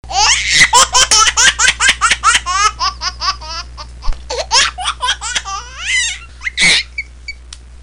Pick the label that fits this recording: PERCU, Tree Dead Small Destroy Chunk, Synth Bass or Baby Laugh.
Baby Laugh